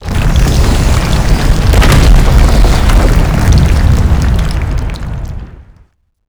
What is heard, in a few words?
reverse gravity; glasgavelen